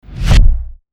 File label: Quick Impact; 特效音